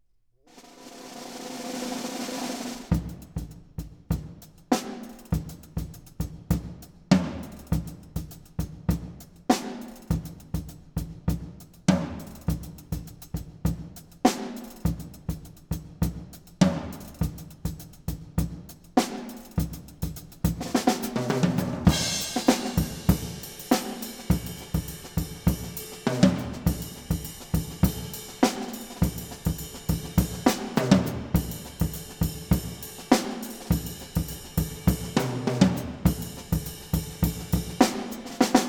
Percussion Imaging Tests, Drum 9 Feet, Live Studio